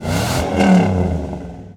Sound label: Truck Dodge Ram Rev; Trucks